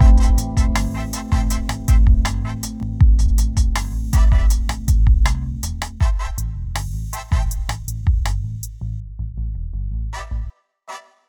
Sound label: Liquid RnB, free loops